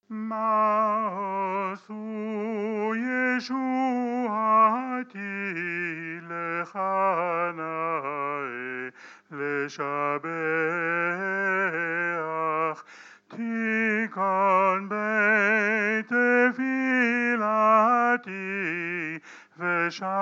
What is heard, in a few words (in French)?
chant; Maoz Tsour; 3 chants religieux